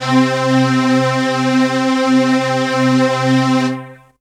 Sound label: Strings C5; Total Drum&Bass